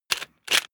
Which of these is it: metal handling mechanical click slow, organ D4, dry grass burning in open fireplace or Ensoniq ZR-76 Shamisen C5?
metal handling mechanical click slow